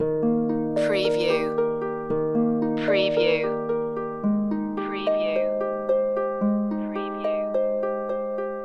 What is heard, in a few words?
yellow mountain loop